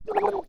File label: Drowning, Humans; zps; HM Water